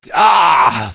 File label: pain, construction